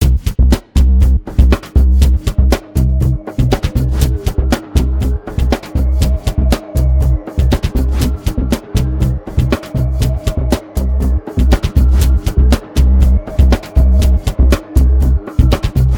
Bassline

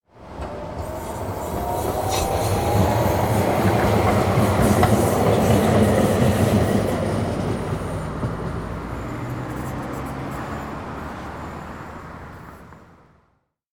Tram Departure

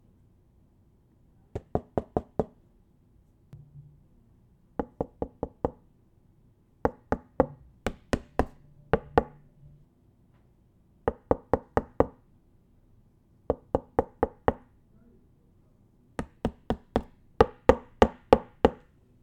Knocking Hammering

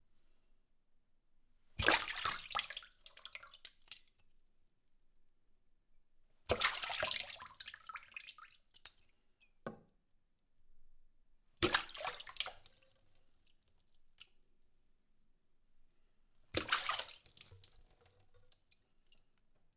Water Splash Kitchen Sink

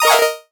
Game Sound Effects; Ableton

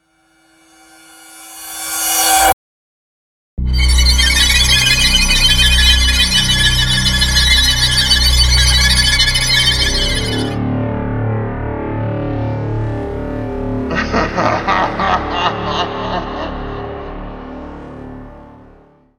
Jump Scare

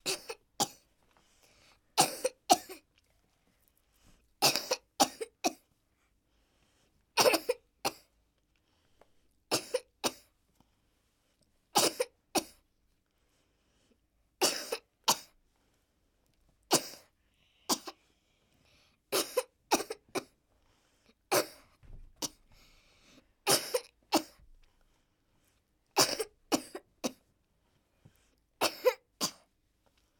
Boy Cough

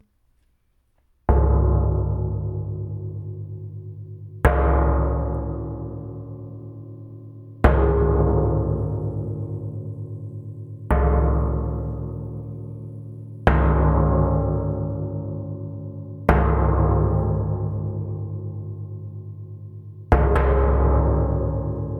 Oil Barrel

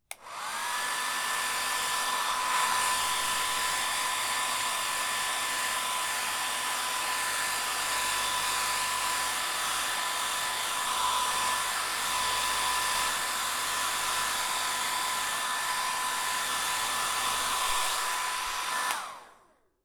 Hairdryer

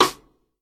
Rim

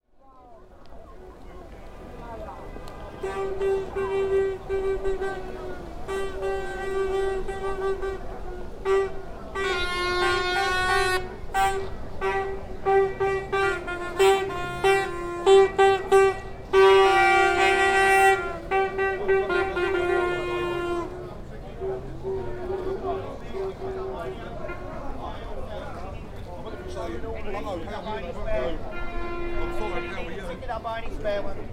Outside Wembley

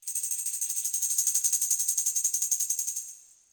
Tambourine Intensive

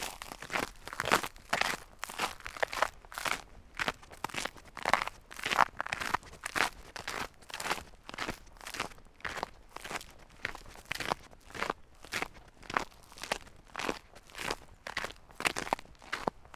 Walking Steps On Cracked Ice Crust

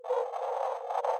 Glitch Sfx

